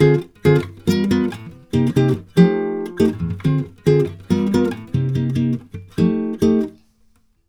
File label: CHORDS, Brazil Bossa; USB Soundscan vol.16